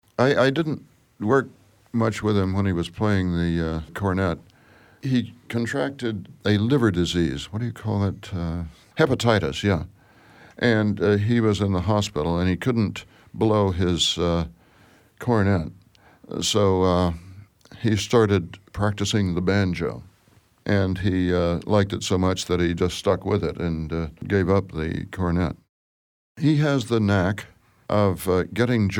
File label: cornet banjo